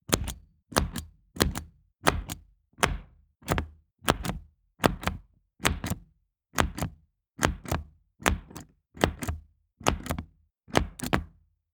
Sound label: Stamping